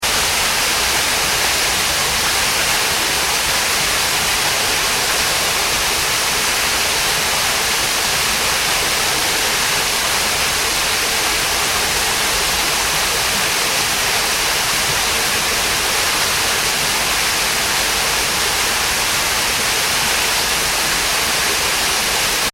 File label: water waterfall small; River; Nature; McKinney Sound